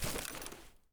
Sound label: earth, human step; earth2; Soundscape Overhaul